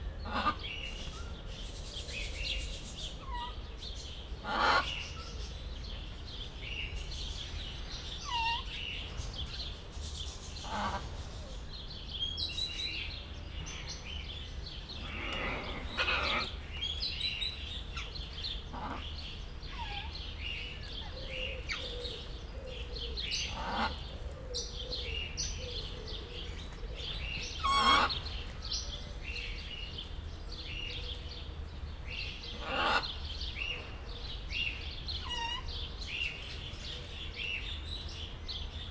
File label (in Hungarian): jobbra koszorúlia torkát, zöldszárnyú arapapagáj; Győri Zoo 2014